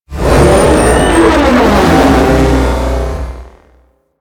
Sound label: Ship Attack Maneuver Generic, Engines; Warframe; Vehicles; RailJack Enemy Ships; Corpus; Maneuvers